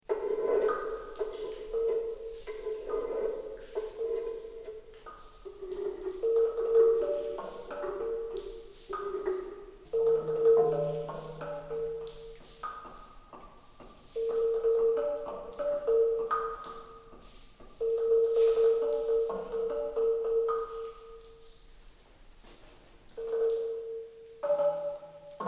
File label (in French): litophone